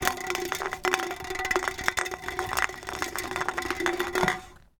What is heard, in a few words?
Aluminum can rolling and bouncing on concrete; Sound Ex Machina; Rolling Objects